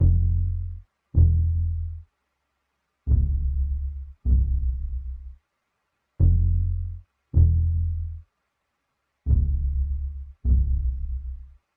155 BPM F Min Bass; Loop Stems; Cymatics; 2022 Melody Collection Platinum Expansion; Warped

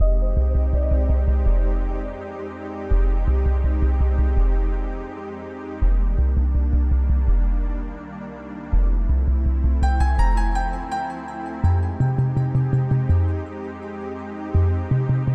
165 BPM C Min, Melodies, DnB; Chanced; Cymatics; GHOST (Beta Pack)

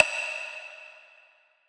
FX Woodblock; Hip Hop; Cymatics - 9God Hip Hop Sample Pack

